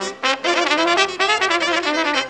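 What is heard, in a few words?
clarinet trumpet; Best Service; World Colours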